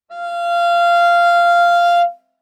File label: Viola arco ff sul D F5 stereo; MIS Pitches - 2014; Strings